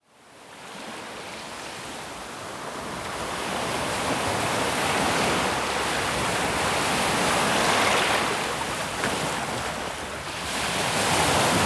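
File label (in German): Meeresrauschen pur Naturgeräusche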